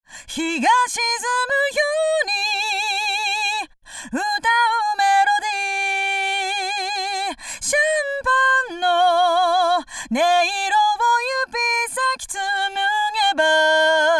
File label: Phaser Phase Mis Match